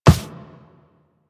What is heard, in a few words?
Walk Default, Footsteps; Warframe; Operator